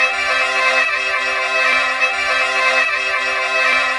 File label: Crystal Pipes; Electronica; Tonal